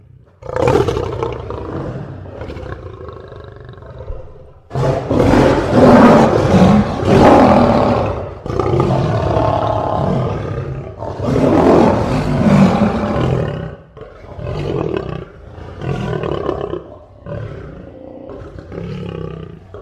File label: Lion Sound